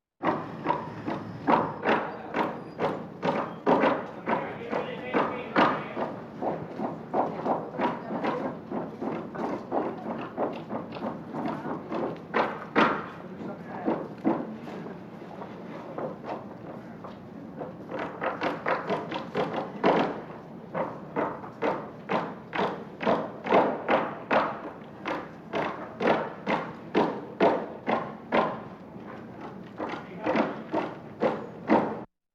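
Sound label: Construction Carpentry Hammering; Electa Quinney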